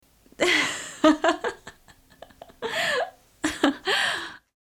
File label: girl laugh; Inseminator